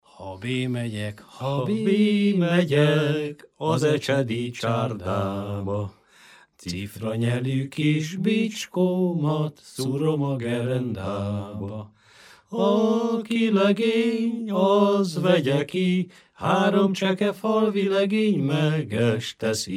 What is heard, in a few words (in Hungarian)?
nepdal; ha bemegyek az ecsedi csárdába